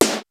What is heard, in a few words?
XR-10 K+SN+H, drums